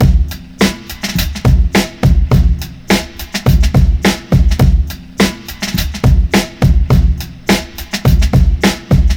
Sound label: API 2500 Parallel Compression